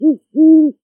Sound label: great horned owl, bird calls